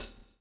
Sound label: swing